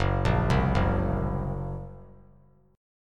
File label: Gb Major Flat 5th, down; arpeggiated; JGuitar